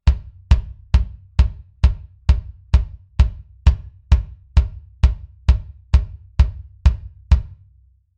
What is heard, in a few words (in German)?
BD Mic Test M201 Mix wet